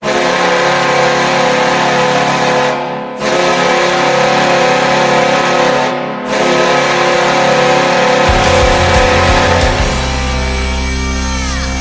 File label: sabres horn, scoreboard